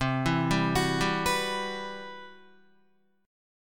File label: C Major Sharp 11th, Guitar, CM#11 chord; CM#11 arpeggiated; JGuitar